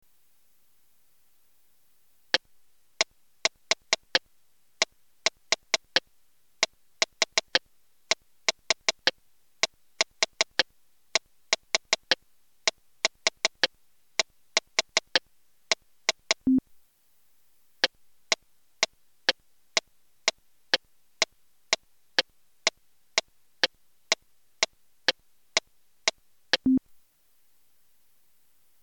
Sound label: metronome; technopipes